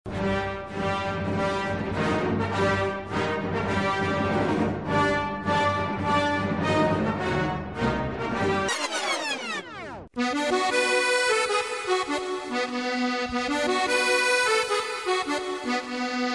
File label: cumbia